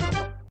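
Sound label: fanfare end of ball, pinball; sfx; WILLIAMS; INDIANA JONES; Indiana-Jones-Original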